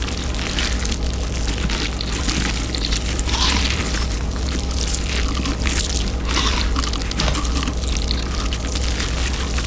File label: ant ship stinger idle; biogenesis